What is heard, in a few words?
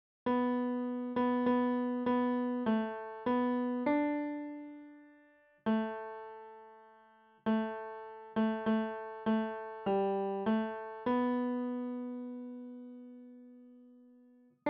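piano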